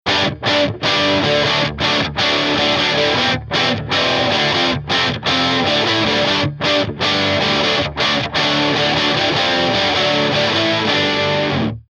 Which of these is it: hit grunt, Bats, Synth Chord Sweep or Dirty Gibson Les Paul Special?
Dirty Gibson Les Paul Special